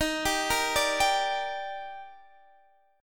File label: Eb Major 7th, Guitar, Half Step Down; EbM7; arpeggiated